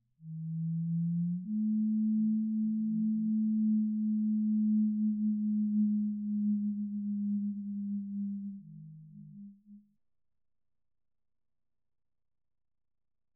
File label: Loon 1000hz lowpass quarter speed; Ruth Happel; pitch tracer